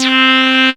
CREEPY C3, sequencer; Best Service Alpha Dance vol.2

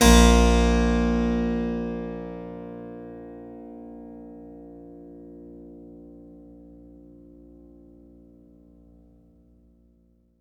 A#2, Synclavier keys and guitars; Sampler Library

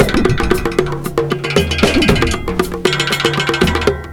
Rodent Power 116 bpm, breaks; Zero-G Beneath the Planet of the Breaks

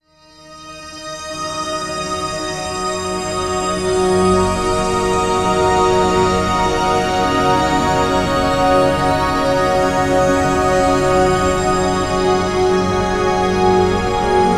Long Hi Complex, Complex Wave

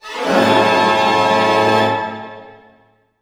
GLISS D# MAJ; Sound On Sound - Peter Siedleczek's Orchestra

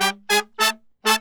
100, BRASS SAMBA

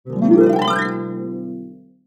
Harp; musicradar; cartoon caper

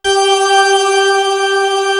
01W CHURCH; East West; Global Sounds

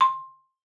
C6, marimba; Xsample VOL-4

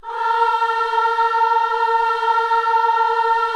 KIDS CHOIR, Gospel

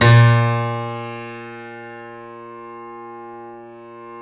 KEY, YC7 Piano ff; Roland L-CD701; ff YC7 St

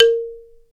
BALA, Balaphone

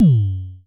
FM Kicks - F#2, Vermona Kick Lancet; Drums; FM Kick - Precise; LookIMadeAThing Kicks

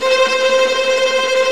TREM STRINGS

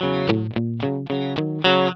ROCK, Vintage Blues Guitar; USB Soundscan vol.22; AKAI; ROCK2

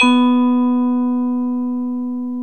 VIBES BELLS

